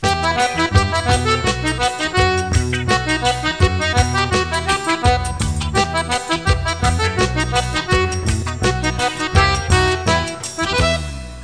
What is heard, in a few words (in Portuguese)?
Forro; GEOGRAFIA